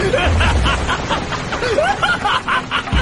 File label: laugh; sukuna